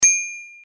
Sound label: Ping